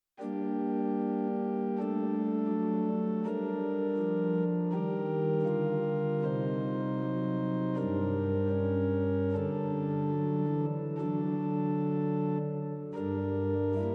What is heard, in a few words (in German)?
Choral, Orgelwerke; J.S. Bach Sei gegrüßet Jesu gütig BWV 768